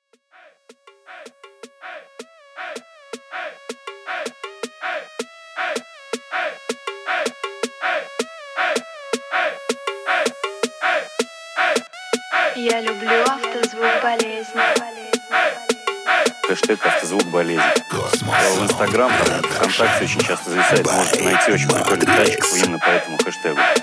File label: 26-29Hz Low Bass